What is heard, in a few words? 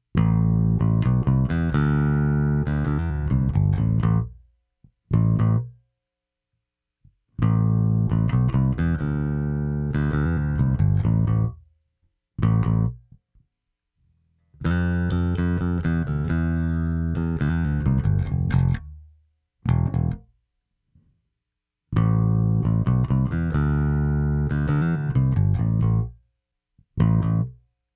Bass Bright Settings